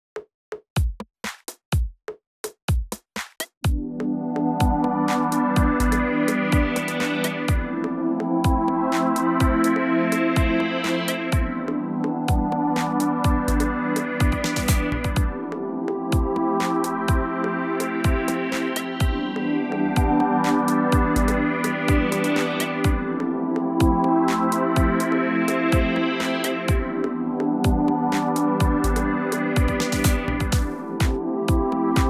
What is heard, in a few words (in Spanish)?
Podcast Chill, Música libre